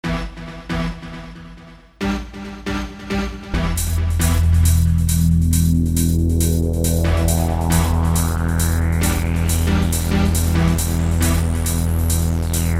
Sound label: no guitar